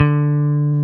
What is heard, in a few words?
BASSES, Roland